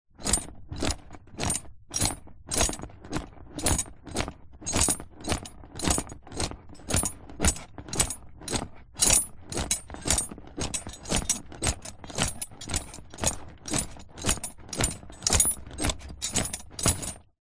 animals horse riding saddle movements leather metal jingles slow